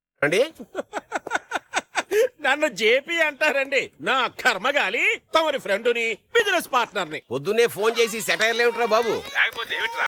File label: happy vocals